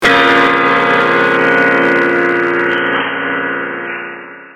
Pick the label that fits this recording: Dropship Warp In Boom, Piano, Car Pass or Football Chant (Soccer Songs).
Piano